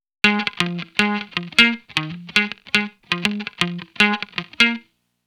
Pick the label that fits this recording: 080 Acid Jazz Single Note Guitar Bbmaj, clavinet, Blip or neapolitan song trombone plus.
080 Acid Jazz Single Note Guitar Bbmaj